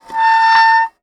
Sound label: Soldering Iron Stand Drag